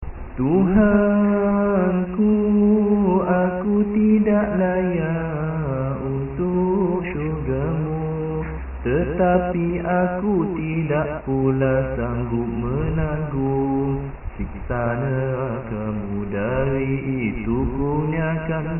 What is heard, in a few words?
ilahilas malay echo